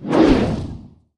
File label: Whoosh Large